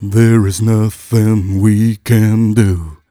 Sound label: m 127 mel a, Vocals